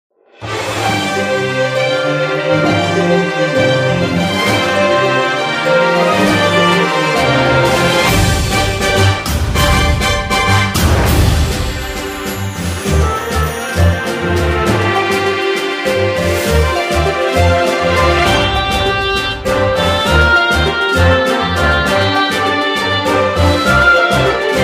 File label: Battle BGM